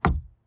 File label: crate hit; hits